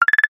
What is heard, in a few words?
notification alert reminder clicks short; zapsplat